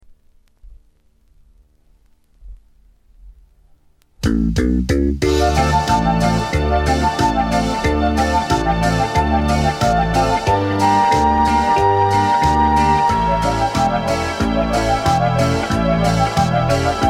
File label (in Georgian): gitara